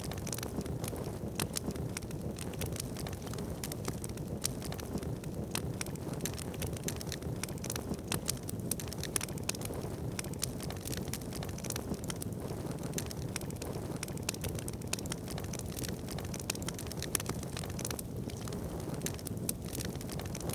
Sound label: campfire